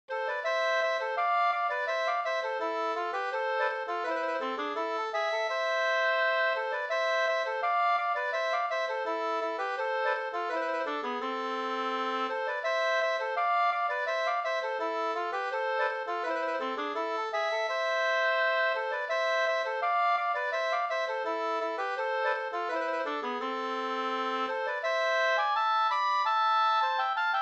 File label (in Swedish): ganglat; fr Enviken e Vilhelm Hedlund nr2